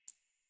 Computer Room Beep Misc

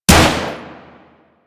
Sicarus Shot 2D, weapons; DE; Warframe

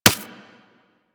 Laser Impact Generic; Surface

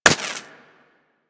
Laser Impact Generic; Surface

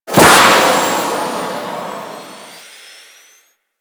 Movement Forward Transition, Vehicles; Warframe; Archwing

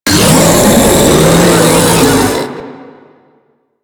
Taunt Scream; Enemies; Abilities; Warframe; Proto Infested Ancient

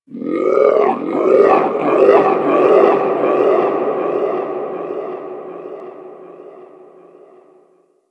Outpost Creature, Ambience; Warframe; Corpus Outpost

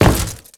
Step Default, Footsteps; Warframe; Grineer

